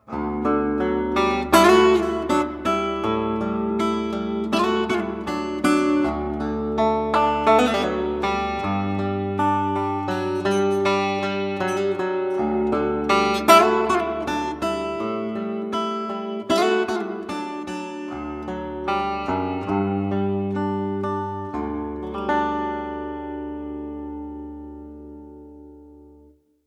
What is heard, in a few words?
reso, guitars